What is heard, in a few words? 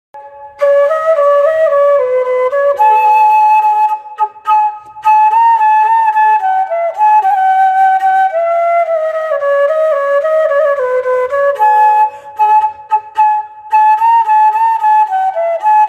bgm flute cover